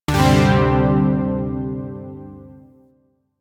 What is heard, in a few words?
Shawzin Pent Maj Chord B; Chords; Warframe; Zariman